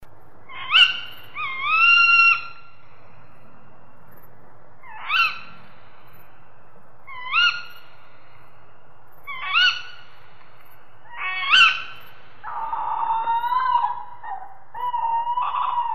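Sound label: tawny owl text alert; animals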